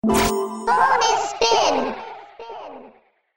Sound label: Bonus Spin; CosmicCrazyCash; ClubFusion